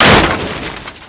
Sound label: fence; WhiteOut; XBOX; bokujo